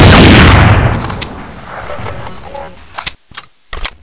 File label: barret m82 fire